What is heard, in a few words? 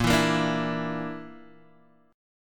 D Suspended 2nd Sharp 5th Bb, guitar